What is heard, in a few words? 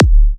D kicks; markus hakala kicks